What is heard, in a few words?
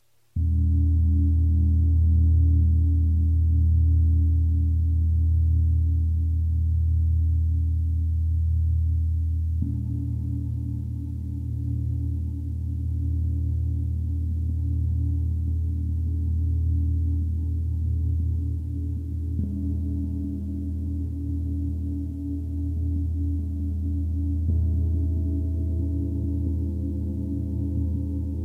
gong; Nibiru